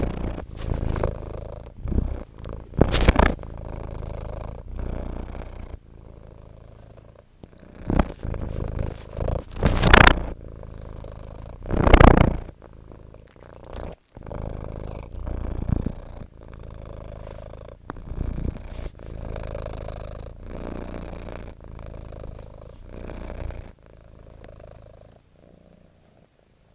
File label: the big purr